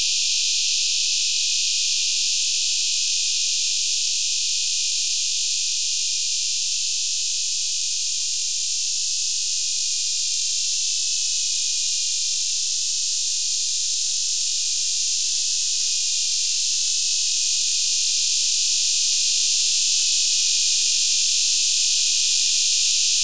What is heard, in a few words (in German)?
drm test rauschen; DRM-Empfängers für 3995 kHz; Dream